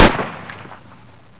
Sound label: 30cal; WAR